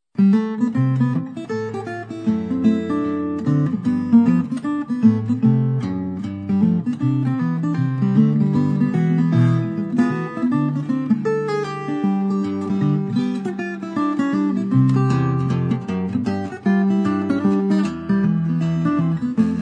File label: Parlor Guitar